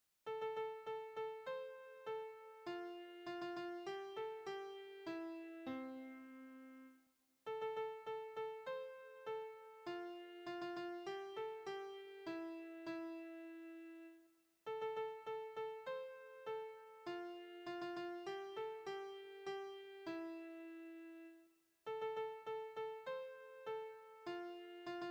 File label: Drumming in Three Quarters Time